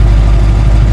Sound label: cord; Mafie 1